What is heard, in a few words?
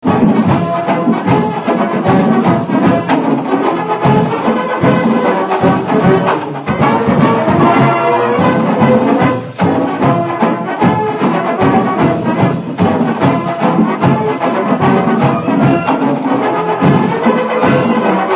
Marching Band Wreck